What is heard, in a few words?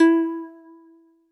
Jazz Harp Kawaii E3; keys